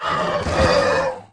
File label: bugmander dead, monster